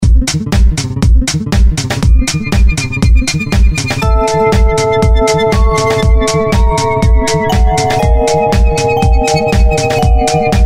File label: horror